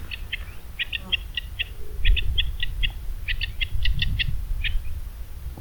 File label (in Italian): verso coturnice Alectoris graeca saxatilis